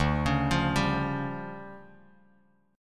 D Minor Major 7th Double Flat 5th, D, Bass Standard; DmM7bb5; arpeggiated; JGuitar